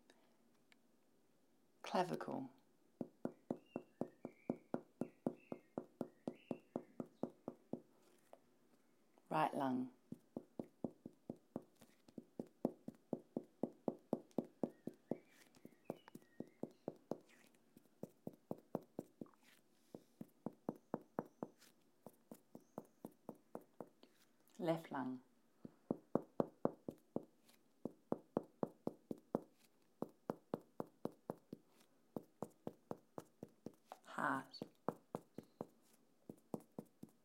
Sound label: AH Percussion